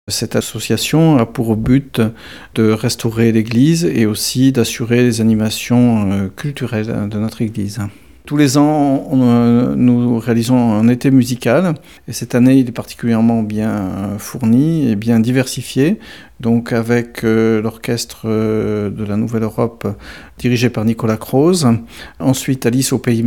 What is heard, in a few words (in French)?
Orchestre de Chambre, Reportage; Royere